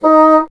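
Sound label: wind bassoon D#; Miroslav Vitous - Solo Instruments VOL-1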